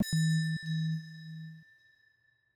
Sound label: Wind Chime, notifications; Samsung Galaxy S25 Ultra; Calm